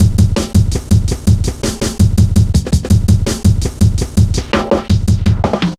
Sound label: Hard Edged, Drum Loops, 165.5; Zero-G Jungle Warfare 1, 2 & 3